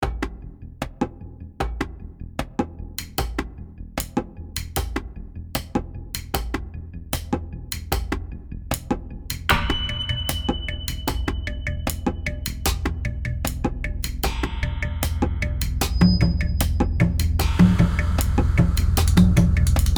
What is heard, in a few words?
Action loop